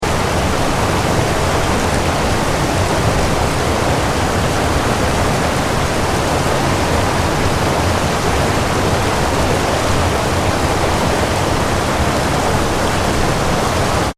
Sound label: water river rapids deep fast; River / Rapids - Heavy , Fast Flowing; Rivers; Nature